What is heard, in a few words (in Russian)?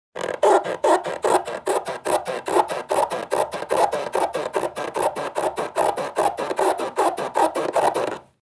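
звук трения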